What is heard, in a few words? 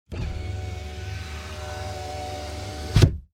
Transportation Auto Nissan Altima Window Roll Up